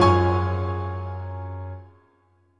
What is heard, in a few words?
piano low